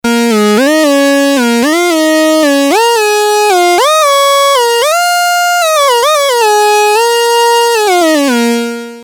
synth legato, novation bass station rack

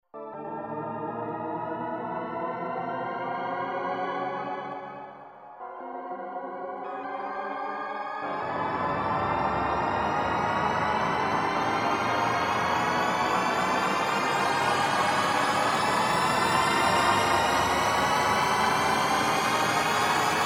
evolve pad, Yamaha TG-500

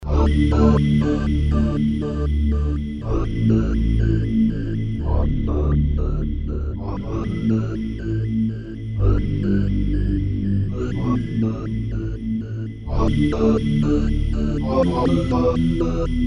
choir; Emu XL1 Xtreme Lead

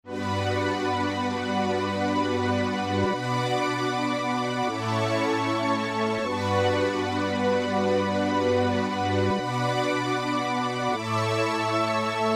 pad string, Roland dj70

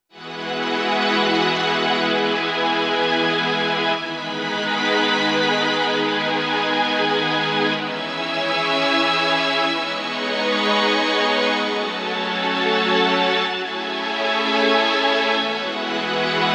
pad strings, synth

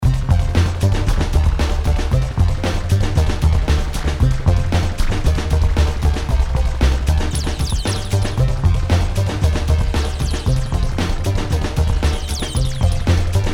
synth performance, access virus

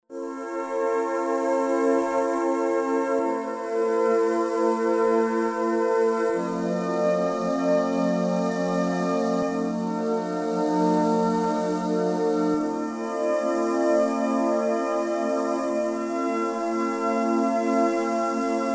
pad simple, roland jv880

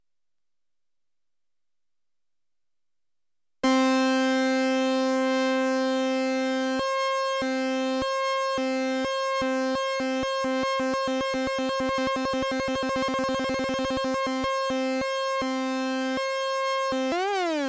all LFO, Kawai sx240

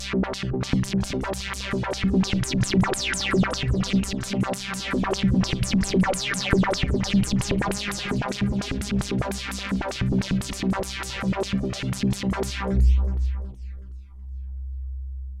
synth acid, access virus

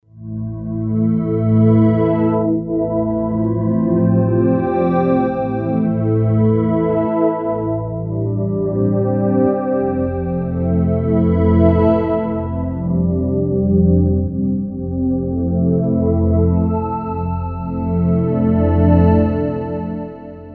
pad relax, Emu planet phatt

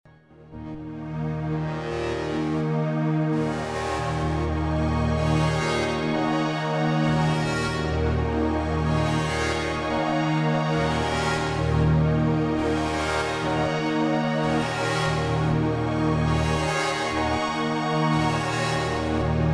yamaha tg33

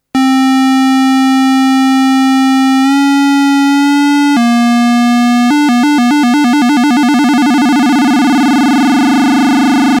test lfo square pitch; Solton SM100

